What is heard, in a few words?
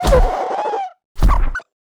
die